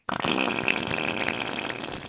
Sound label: Fart Long